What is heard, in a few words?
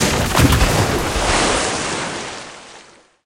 Removable Ice; Village